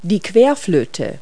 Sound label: FLUTE; Multi-Lingual Talking Picture Dictionary